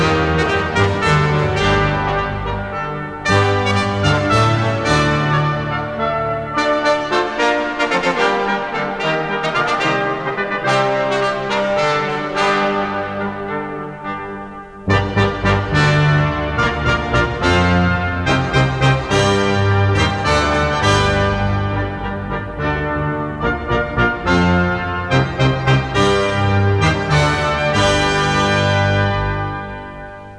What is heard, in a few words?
classical